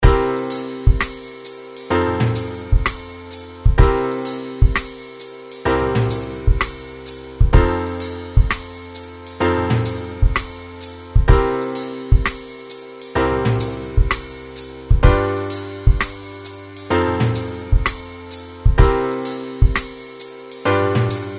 blues with subdominant and dominant, blues chord progressions